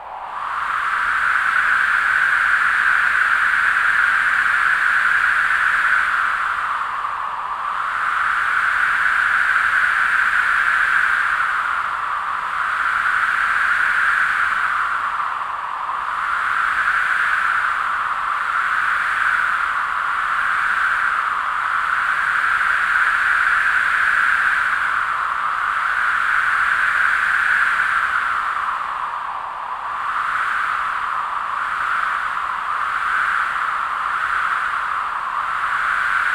Dynamic Through Draft - Loop; The Sound Pack Tree; This Library Sucks

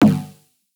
Snare, Data Mosh Hits; Snares; 99Sounds; Mangling Audio

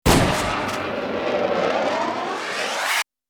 Bullet Time; FXHome; Bullet and Gun

